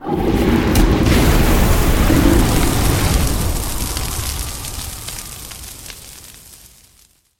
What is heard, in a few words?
Gold Dragon Breath Weapon Fire; SyrinScape; Vault of Dragons